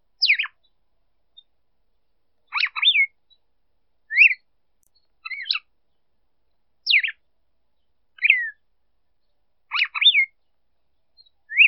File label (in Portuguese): Femea de Galo de campina Cantando para Esquentar Macho